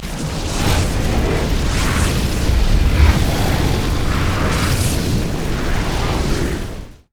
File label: scorched earth; LoL; shyvana